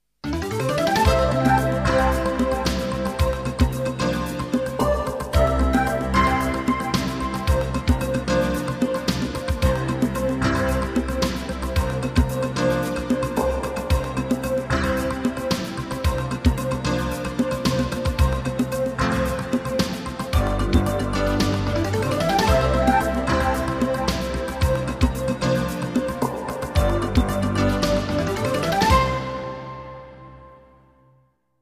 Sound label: jingles; 90 DDD Jingles